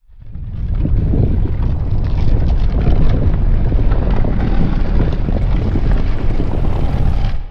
spin Start; asgardian stones

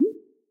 reel Stop; berry burst

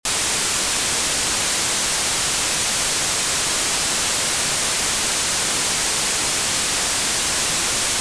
noise redux

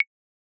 primary button

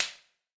spank; spellbound

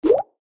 popup; idle mining empire

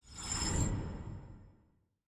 leave project

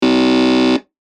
error

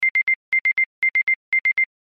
alarm

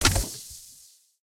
spin Button Click; archangels

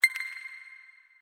crash count end

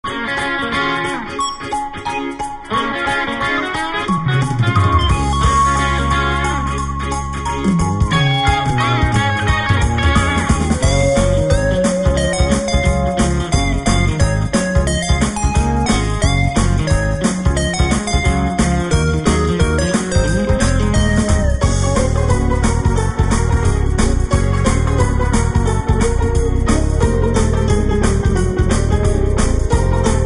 menu music; BombIt5

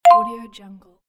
ring

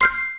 coin Added

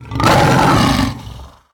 win Lion; jumanji

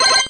coin double

